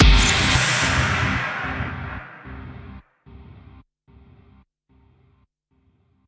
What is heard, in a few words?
End Sting; Void Bastards